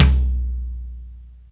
verby tom sound B key

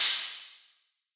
Long Room Reverb Open High-Hat Sound F# Key

